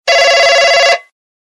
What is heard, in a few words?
phone ringtone